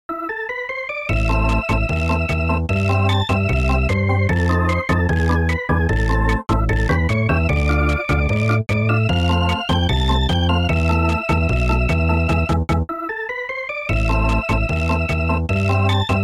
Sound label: ghost music